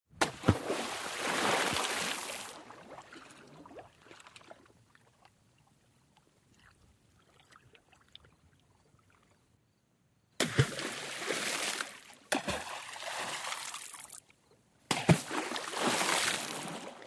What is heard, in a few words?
Splash Boulder Rocks